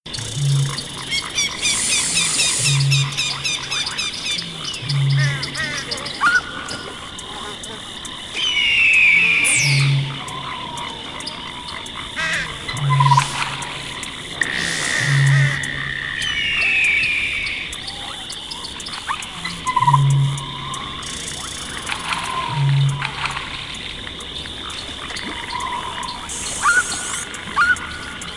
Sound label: (Ambient) The Swamp; Rayman 2 Remastered